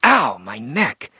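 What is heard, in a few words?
Hit and Run Male Neck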